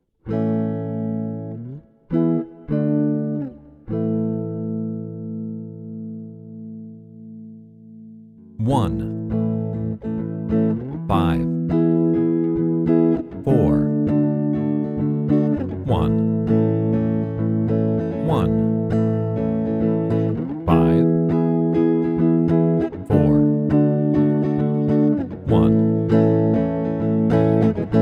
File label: I V IV I guitar A